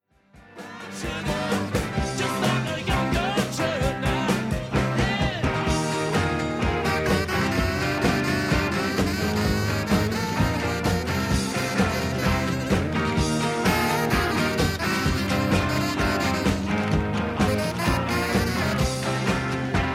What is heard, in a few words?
sax solos